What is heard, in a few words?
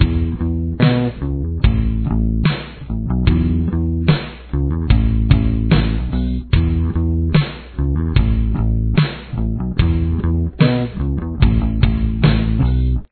bass